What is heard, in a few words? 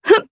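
Vox Jump Japanese; Nicky; kings raid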